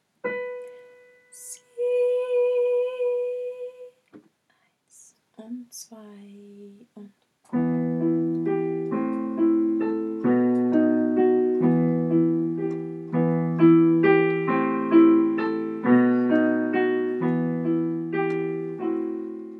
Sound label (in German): Begleitung